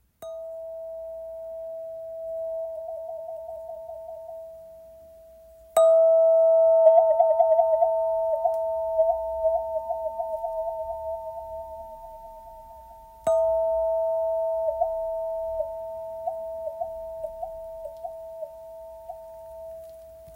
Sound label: Wahwah tube 27cm